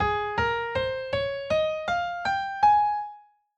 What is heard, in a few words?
Major Scale Ab